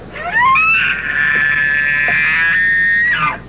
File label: Elk Bugle